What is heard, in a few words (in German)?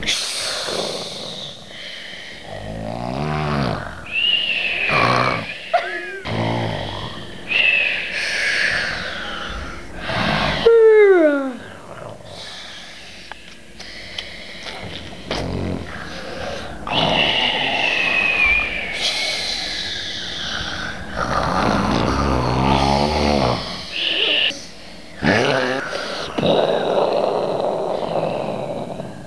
schlafende Drachen in Hoehle; Das Schnarchen; welt-der-drachen